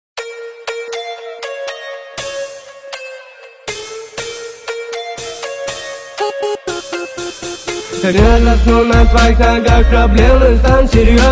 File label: Sax